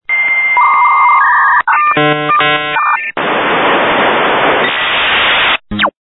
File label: dial up sound; ringtones